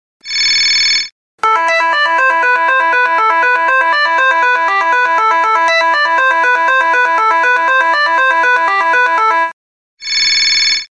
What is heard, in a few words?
Iphone Cell Phone